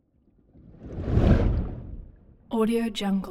water whoosh abstract soft; smartsoundfx

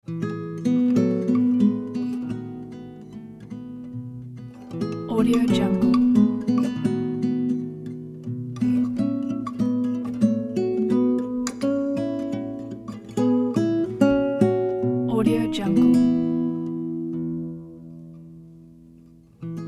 intimate moment; sound-ideas

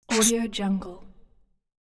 check mark; premiumstocksfx

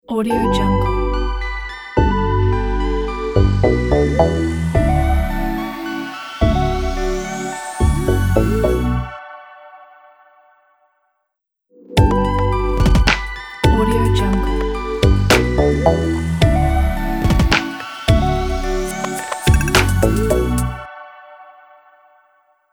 happy calm logo

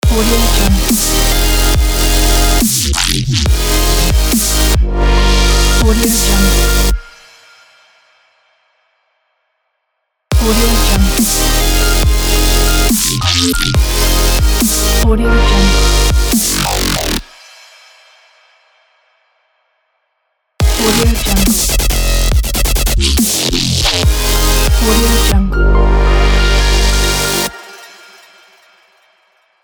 dubstep logo; jazzy2001

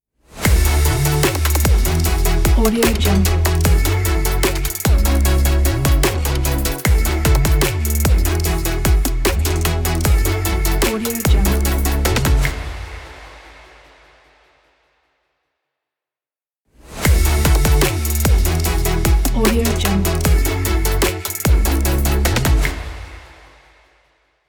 future bass motivate logo

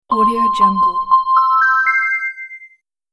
game progress

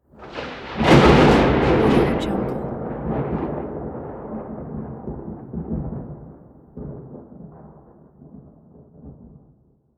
far lightning strike

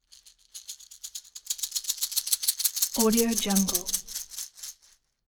object shaker wood rattle dynamic movement; smartsoundfx

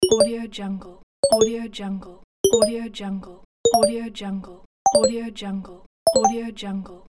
alert notification

